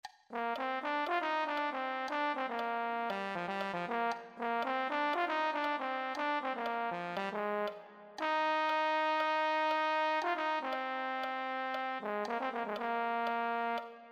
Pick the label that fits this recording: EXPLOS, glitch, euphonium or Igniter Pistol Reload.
euphonium